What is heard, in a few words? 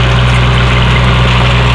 tank; Chopper2K